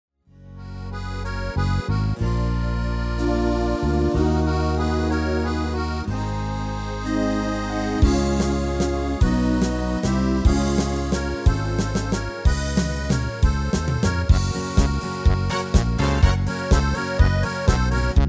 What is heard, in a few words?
MIDI